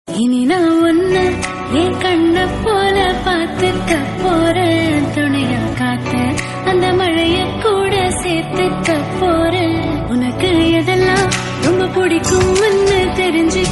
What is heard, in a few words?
love song tamil; Don